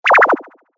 cancel

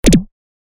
move

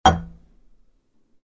Put down the cocktail glass

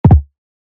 drop

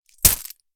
Coin Purse; game inn

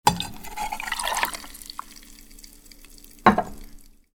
Pour the cocktail into a cup

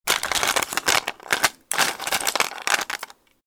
Ice Sound

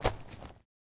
footsteps gravel

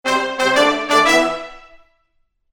game clear

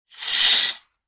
open a curtain; theater

